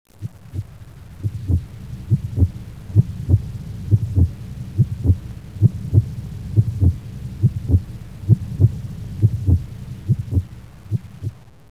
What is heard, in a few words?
heartbeat; tfc